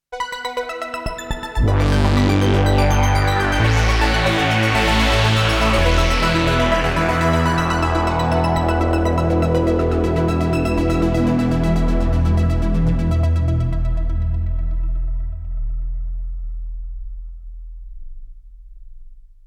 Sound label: ARP; redshift6; ARP SynthCastNews84; supercriticalsynthesizers